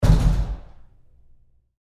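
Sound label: Door Bathroom Stall Close Roomy Medium; Household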